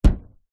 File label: vehicles car bonnet hood hit footstep bang ext